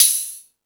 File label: Rap Session, Drum Machines